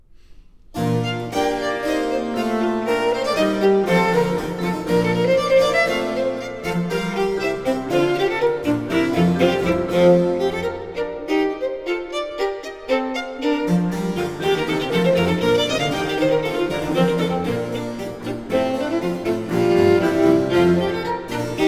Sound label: Violin Sonata in C Major, II. Allegro; Corelli; Violin Sonatas Op. 5; La gioia; Lina Tur Bonet; Musica Alchemica